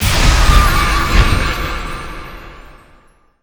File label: wild Expanding; netent